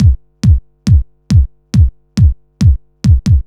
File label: ACID TECHNO